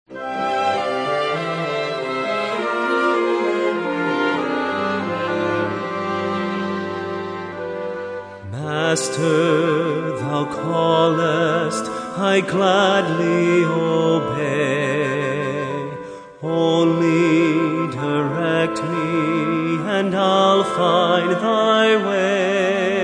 Singers With Orchestra

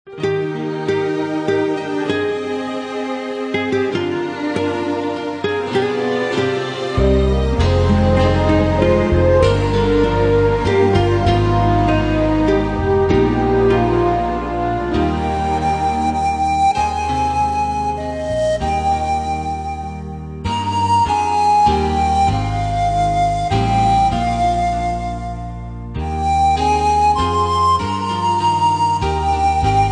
Instrumental Hymns

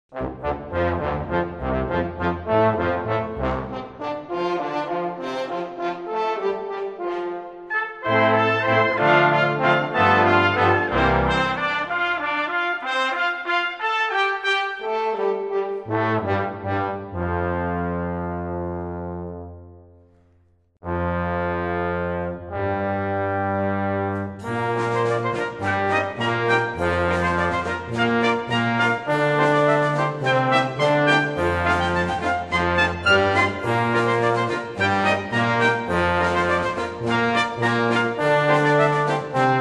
brass ensemble

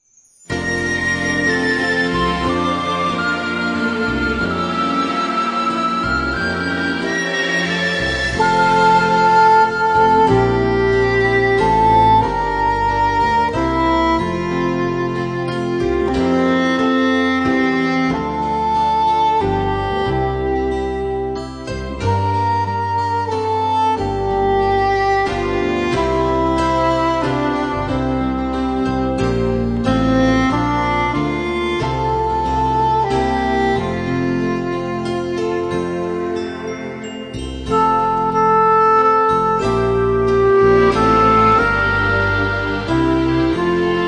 Instrumental Hymns

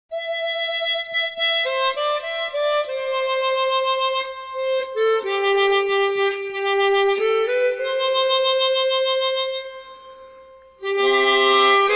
Chromatic Harmonica; Just A Closer Walk With Thee

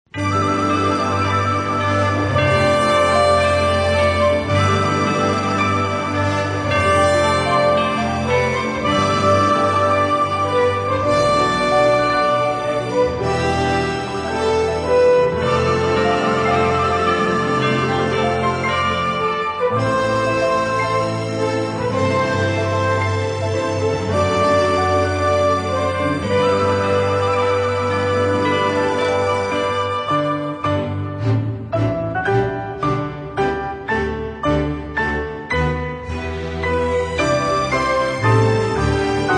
Orchestra

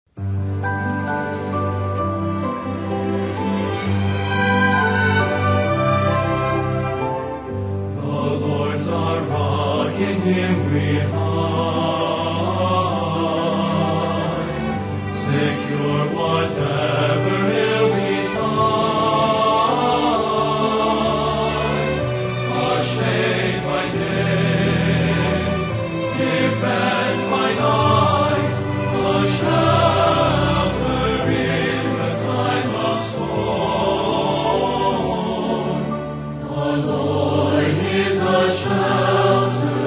Singers And Orchestra

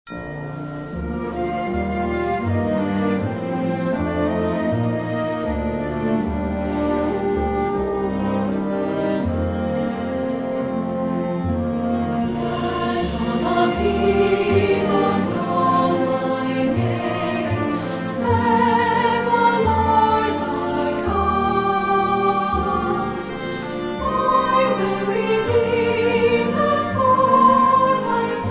Singers And Orchestra

